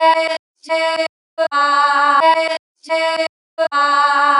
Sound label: chopped vocals female Em 115